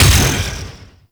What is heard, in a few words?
Ship Dual Cannon Shot, Weapons; Warframe; Corpus; Moa